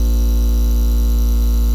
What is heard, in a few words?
health low pitch loop